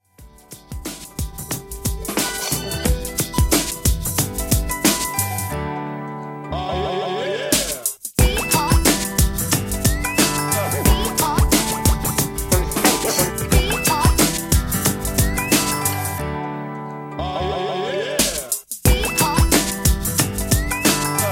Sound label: Throwback Redrum 90 Bpm Clean